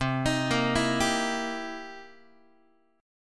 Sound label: C 7th Sharp 9th C Guitar; arpeggiated; JGuitar